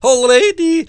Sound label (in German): jodel